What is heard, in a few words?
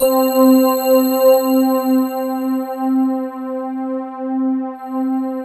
FANTASY CHF, JV PADS; Pro-Rec - Synthsonic